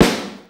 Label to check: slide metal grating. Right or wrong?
Wrong